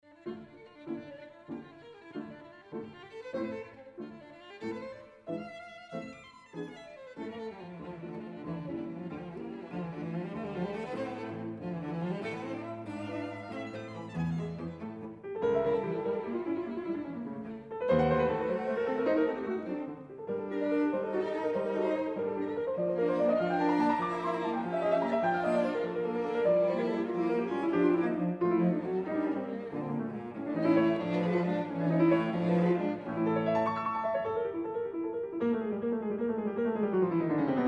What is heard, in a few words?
piano trio; marschner